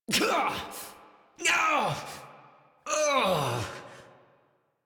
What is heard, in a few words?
Struggle Long, Adult; Warframe; New War; Adult Operator